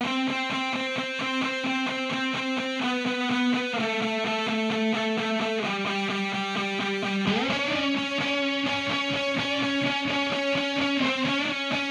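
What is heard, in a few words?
guitar riff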